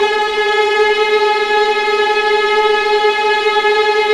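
VLA G2 TRM, viola tremolo, The Ultimate String Collection; East West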